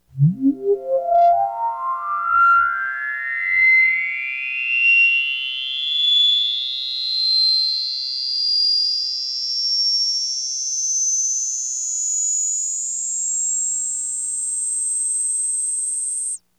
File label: sweep, Synth